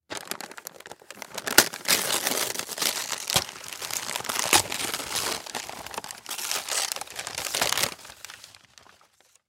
Present Tear Open, Present Tear Open Wrapping Paper; Foley; Ripping; Objects; Blastwave